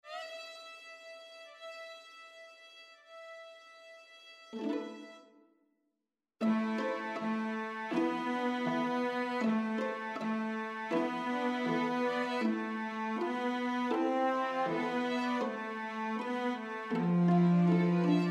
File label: sakura quartet; japanese music